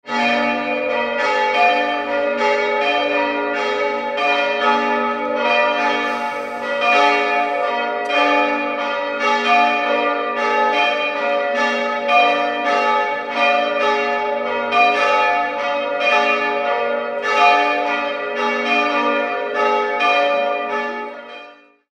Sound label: cathedral sound effect